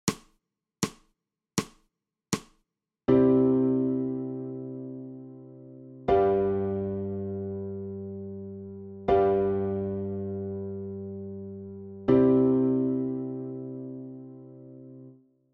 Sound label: ALTO